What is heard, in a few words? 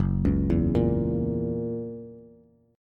F sharp 7th, Bass; arpeggiated; JGuitar